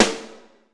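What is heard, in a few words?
snare drum fusion; RY30DRUMS; East West; Global Sounds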